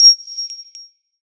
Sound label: Impact